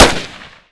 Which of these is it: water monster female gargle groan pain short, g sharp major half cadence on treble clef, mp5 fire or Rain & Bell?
mp5 fire